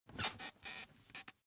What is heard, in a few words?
office chair creak; objekte; stuhl